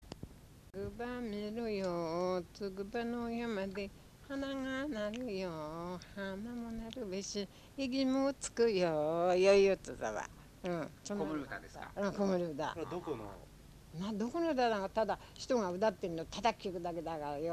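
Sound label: lullaby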